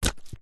footstep single shoe female boot leather buckle wet mud